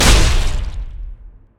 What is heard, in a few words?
dragon strike hit; LoL; jarvaniv